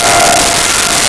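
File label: Distortion Guitar F6; Nintendo; July 2020 Gigaleak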